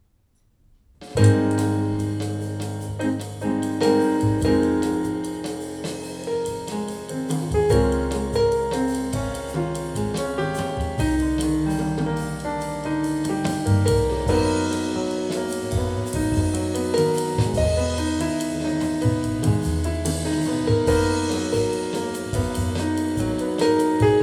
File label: JAZZ; X-PM3 + クロック22.5MHｚ